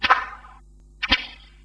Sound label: lash